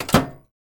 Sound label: closing microwave